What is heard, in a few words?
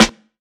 Clean Snare Drum D Key, Loudest frequency: 2147Hz; snare drum samples